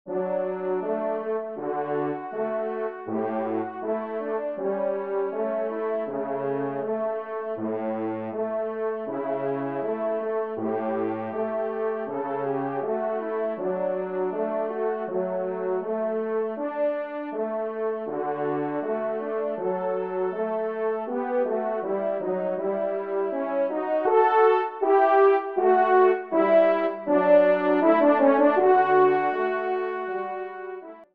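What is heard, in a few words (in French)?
duo, ensemble, trompe cors; Kling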